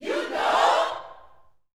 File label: U KNOW, gospel shouts; Spectrasonics; Vocal Planet